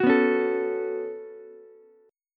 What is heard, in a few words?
C sharp 7th Suspended 4th Sharp 5th, Ukulele; C#7sus4#5; strummed; JGuitar